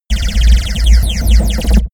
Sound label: space laser blaster cannon; soundpool